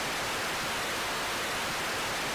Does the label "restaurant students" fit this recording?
No